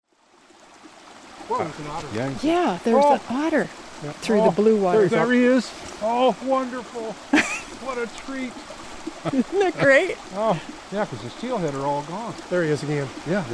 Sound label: River otter